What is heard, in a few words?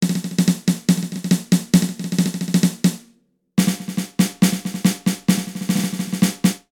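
orchestra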